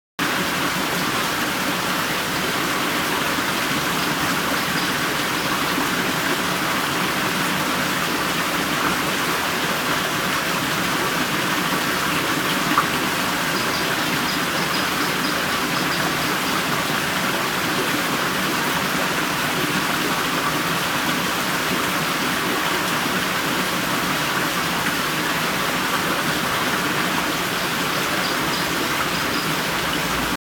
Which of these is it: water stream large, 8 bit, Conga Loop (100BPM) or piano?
water stream large